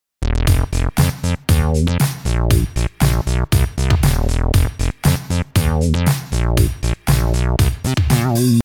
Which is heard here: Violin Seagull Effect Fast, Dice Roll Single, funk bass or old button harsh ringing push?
funk bass